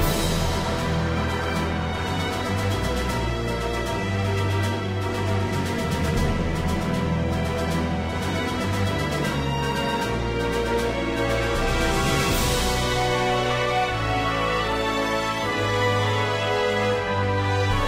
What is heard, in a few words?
bgm battle; Granblue Fantasy; Android